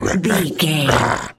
zombie voice comedy

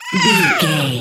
goblin fairy effort push fast

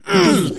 voice male grunt pain

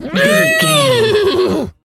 horse neigh

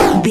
retro impact hit

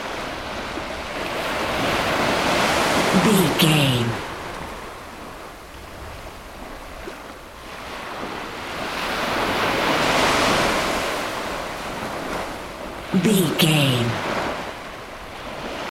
beach waves ocean calm loop short version

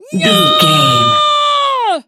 voice female a battle shout

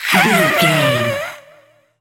ghost witch voice hiss

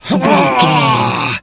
voice male effort struggle

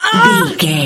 voice female hurt pain choke

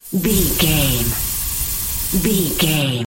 gas leak med burst

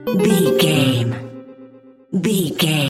jingle chime negative

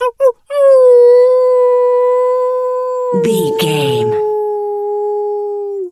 wolf howl long

